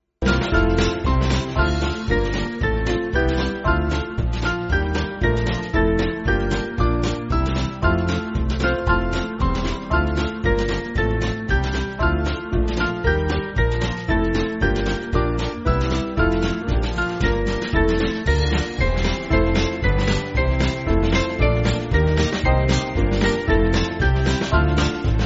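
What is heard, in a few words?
Band, Music Backing; Dear Christians, one and all rejoice; Nun Freut Euch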